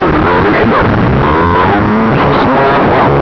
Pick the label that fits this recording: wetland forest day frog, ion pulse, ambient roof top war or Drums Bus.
ambient roof top war